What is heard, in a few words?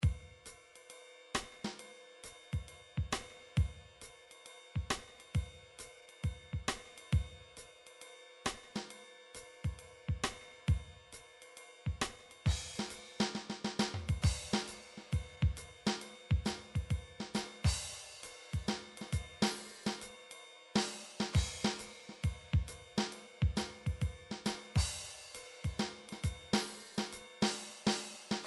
drum midi bigband